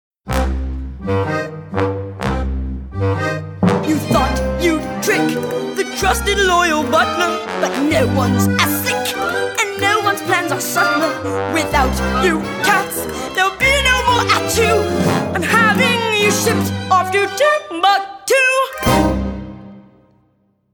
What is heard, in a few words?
Cat Wash Guide Vocal